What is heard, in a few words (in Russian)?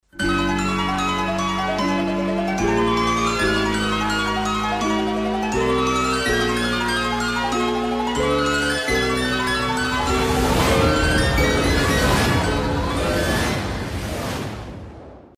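эффект появления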